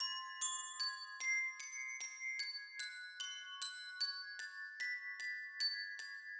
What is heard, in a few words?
Glock 75bpm C; horrorscape